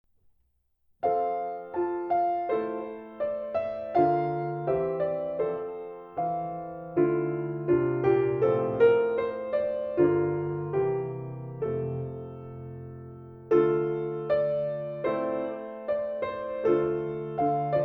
Miniature Chorale

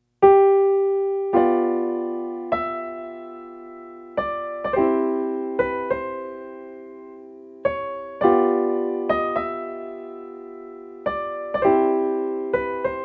Mazurka in C

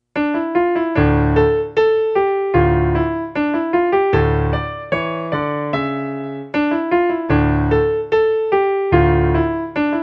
Tambourin

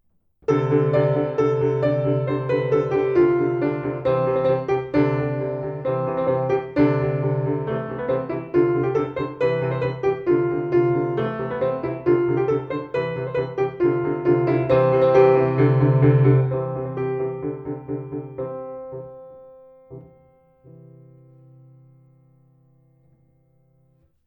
Listen to the Drums

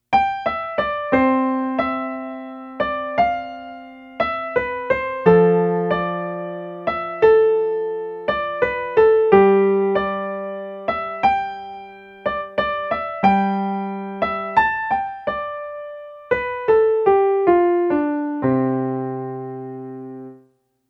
Piano Concerto Theme; Tchaikovsky

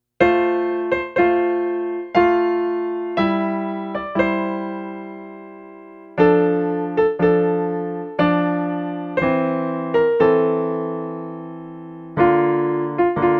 Mazurka in F

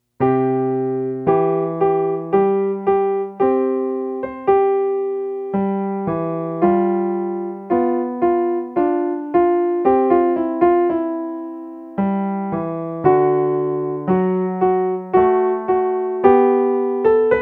Minuet, Beginning Pianist; Mozart

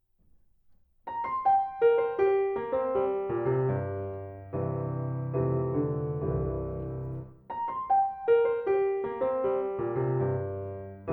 Woodwinds and Brasses

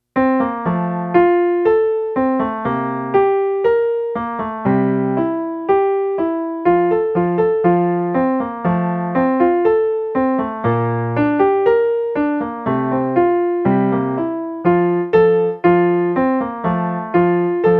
Glockenspiel; Mozart

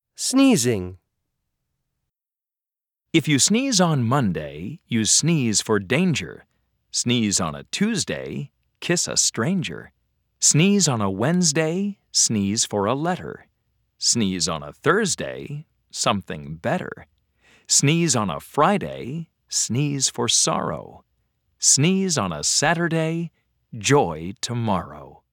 Sneezing

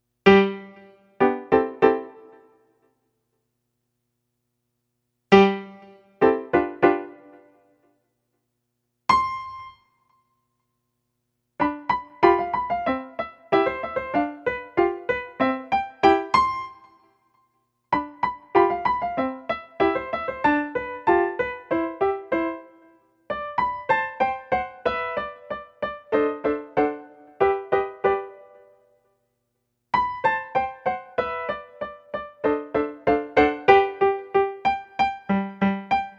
Pizzicato Polka; Strauss

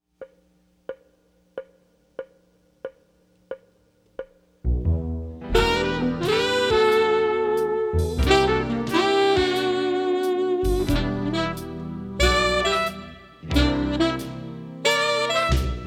Funky Blues

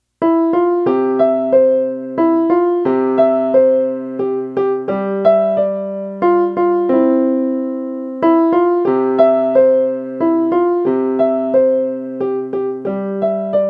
Swiss Melody; Beyer

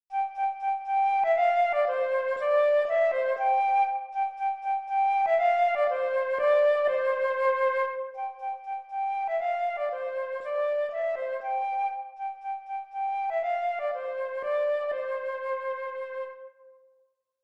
Flute Concerto

